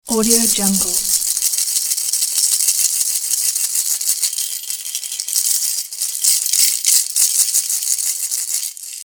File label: full piggy bank shaking